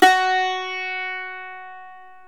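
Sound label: ethnic plucks, balalaika; Eth Plucks, Pluck Duo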